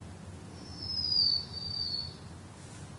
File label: Birdsong Oakland